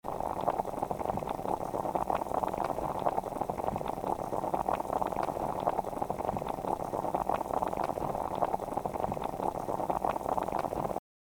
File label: Boiling water bubbles loopable; Cooking